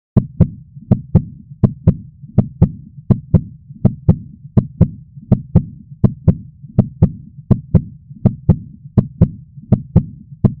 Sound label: heartbeat ringtone telephone ringtone